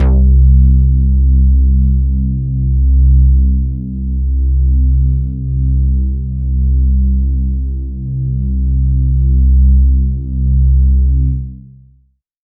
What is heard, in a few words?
PAD C2, Roland MKS-80 synth pad; Pads